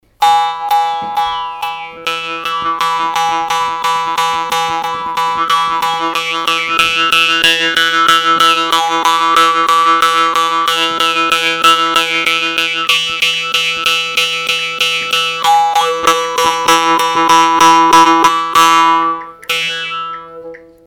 e3 164,81 hz, harp

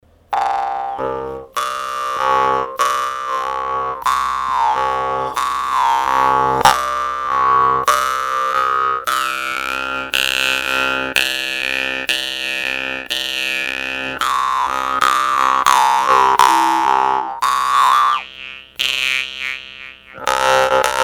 c2 66,41 hz, harp

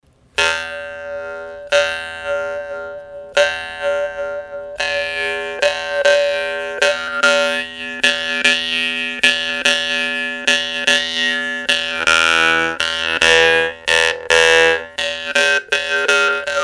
e2 82,41 hz, jew harp

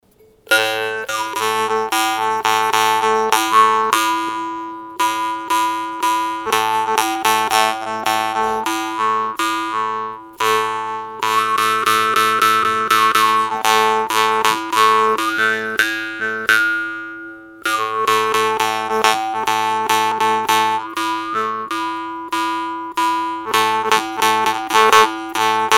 a2 110,00 hz set, harp

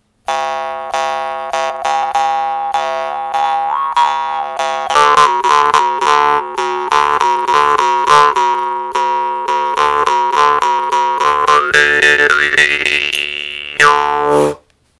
d2 73,42 hz set, harp

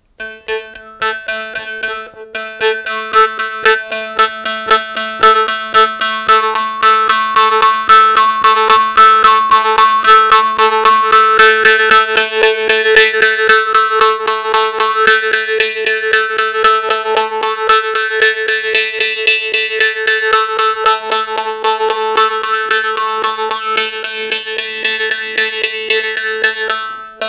a3 220.00 hz, harp

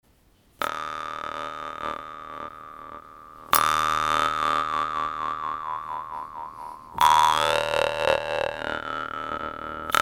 dis1 38.89 hz, harp

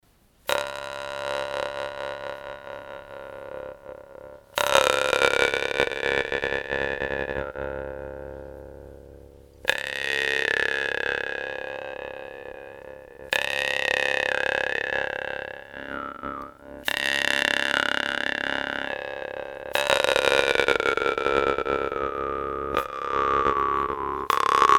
cis 34,65 hz, harp

